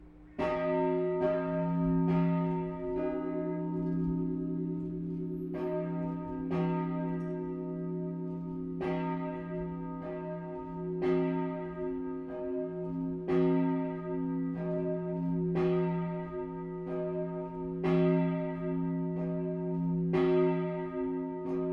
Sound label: bell north heiden feuerin; bells